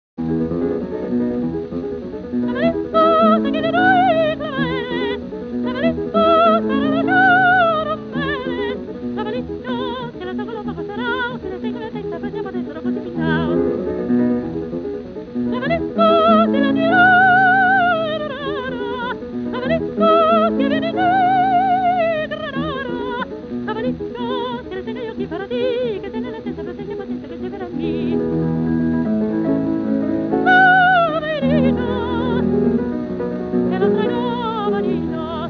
koloraturnoe soprano

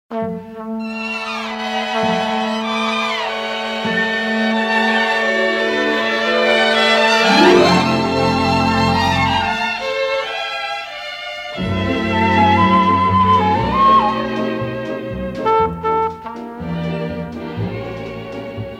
Мелодии трубы

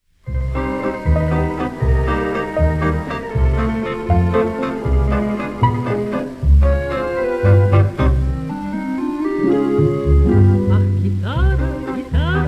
gitara

